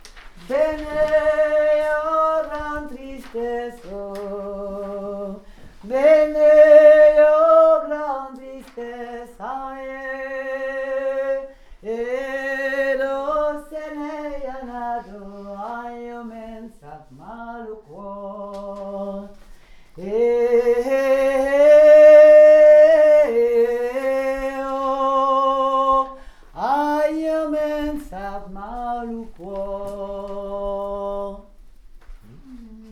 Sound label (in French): VOIX 2; Be nei ua gran tristessa